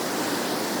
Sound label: Rocket Fly; Cut the Rope Holiday Gift; android ios mobile gamerip